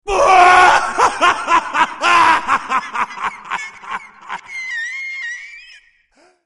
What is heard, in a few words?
Laugh; ringsignaler; Svullo